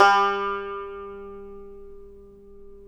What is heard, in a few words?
tar; Roland - LA Composer vol. 3